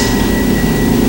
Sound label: HYDRAULIC; Northstar; The Wizard VOL-1